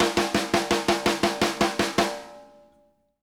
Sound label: SNARE L, drum fills; Sampleheads - New York City Drumworks VOL-1